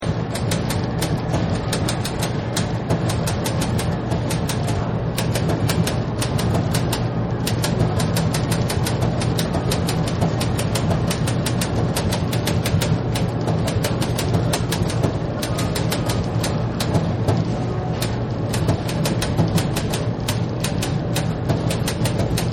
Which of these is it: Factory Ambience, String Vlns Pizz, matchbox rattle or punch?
Factory Ambience